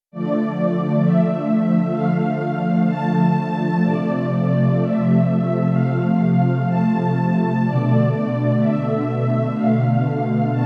Ana String Ens, Korg Radias-R

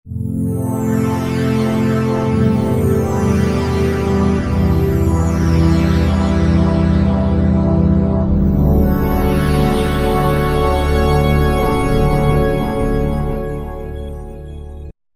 Spangled Glss, Roland V-Synth; Factory Patches

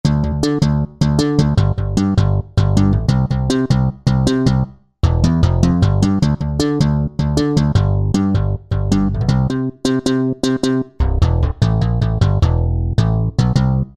Bass The FM Bass, Yamaha EX5R; Factory presets

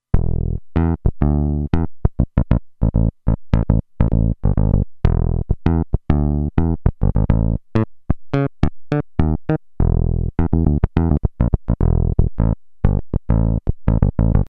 E Bass, Roland Alpha Juno-2; Factory 2

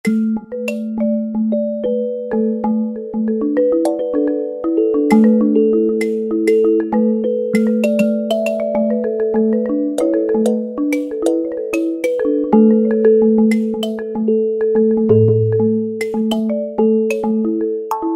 Velo Afro, Roland SR-JV80-05 World

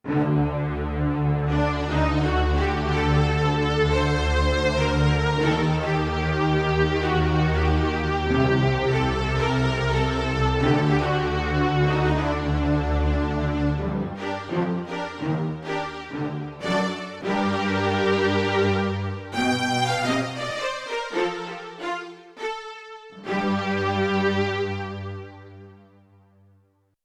String Quintet, Strings; Yamaha TX16W; AtsumiString-Quintet

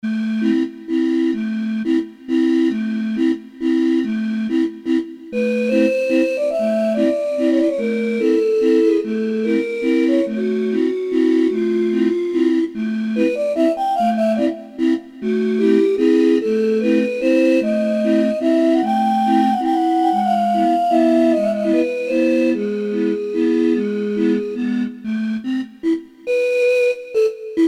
Bottles, Wind Instruments; Korg DSS-1

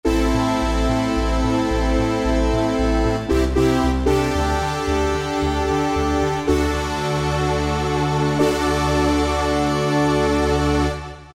Anlog, Yamaha TG33

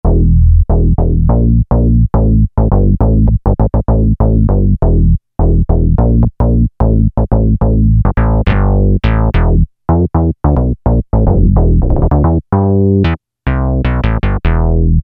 80's Bass, Korg MS2000; Factory Patches

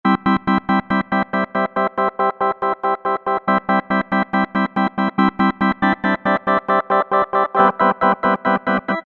Comp, Alesis Micron; Factory Programs

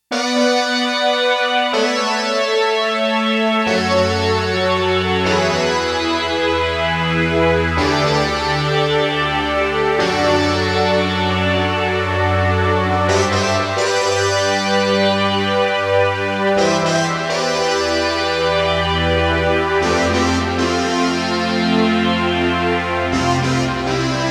SYN STACK, Roland JX-1; Factory presets

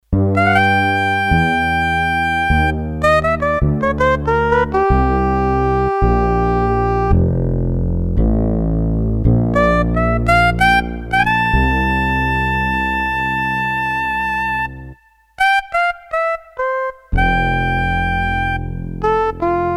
Sp Sax + Frls Bass, Roland D-10; Factory Patches

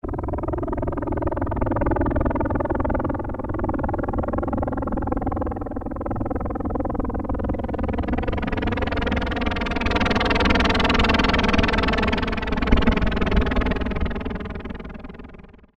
Helicopter, Roland MKS-50; Factory examples

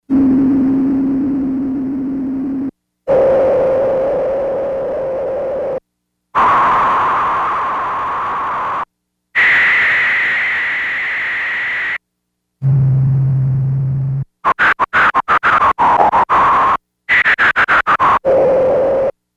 LFO NOISE, Yamaha DX100; Factory Patches

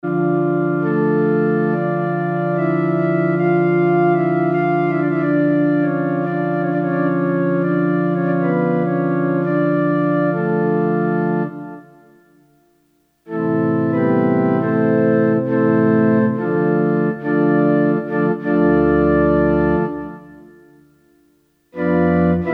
Octaves; Multiple Inst

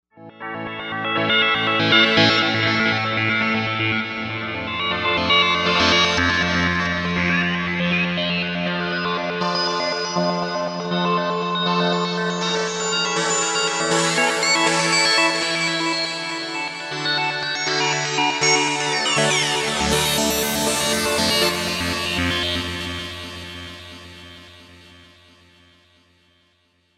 Silly Pad, Novation UltraNova; Factory Patches

